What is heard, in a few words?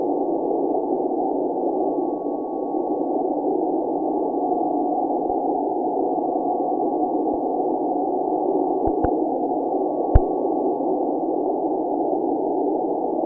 Beacon